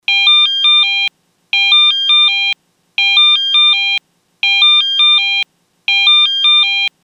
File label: Alerte; CIBS